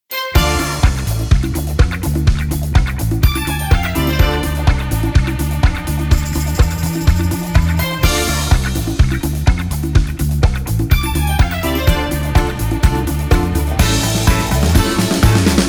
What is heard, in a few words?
Funky Disco